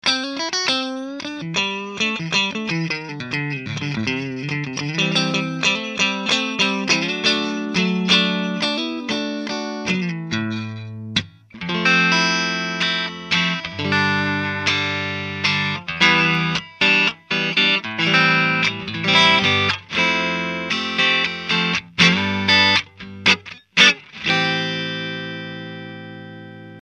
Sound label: Clean strat pluck; in an casual manner with a Shure Unidyne 545 microphone; connected to a Plll-450 PC with a E-Mu soundcard